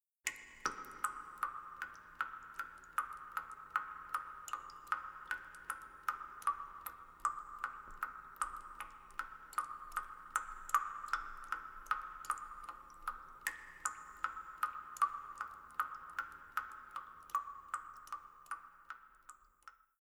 water drip

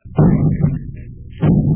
explosion

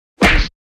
punch face hit; Asian Show Sound Effects

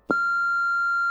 beep

